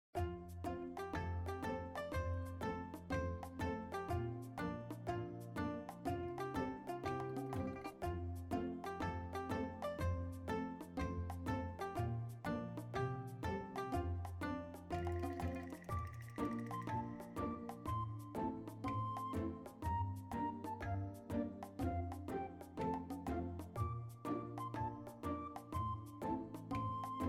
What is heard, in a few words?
bg Music